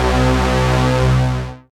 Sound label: DD SYN, Disco House; Best Service Dance Mega Disco House